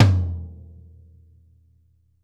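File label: floor tom; drum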